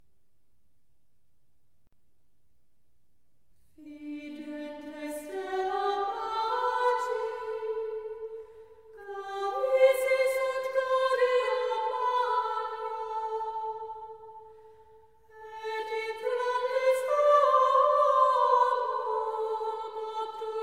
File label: Antiphon; Videntes stellam